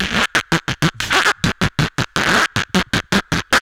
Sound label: VOCDRUM, MOUTH PERC; Best Service; Hyper Dance